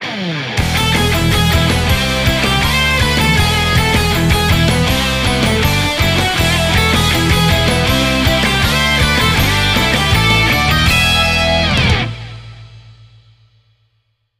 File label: Jingle; Gran Turismo 5; GT5